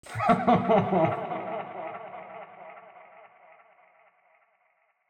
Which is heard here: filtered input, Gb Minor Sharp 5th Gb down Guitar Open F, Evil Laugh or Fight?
Evil Laugh